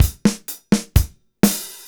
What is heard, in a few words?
120 DRUM, Mediteranean Traditions; Soundscan vol.53; AKAI